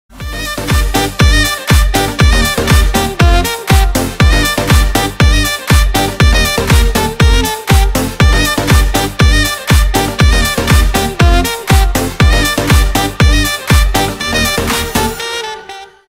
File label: remix instrumental